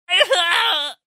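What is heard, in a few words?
sounds of goblin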